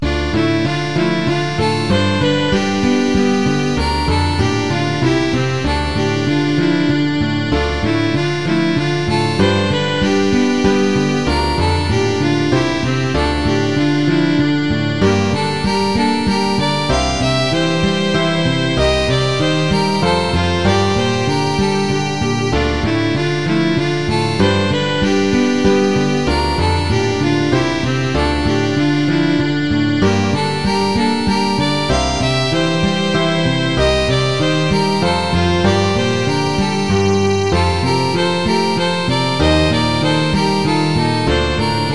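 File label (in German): westfälischer tanz; nr 5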